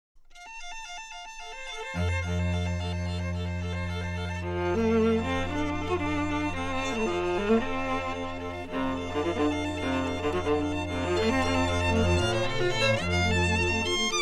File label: Dvorak string quartet no 12